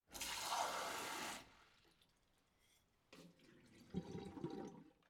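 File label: filling with water from the tap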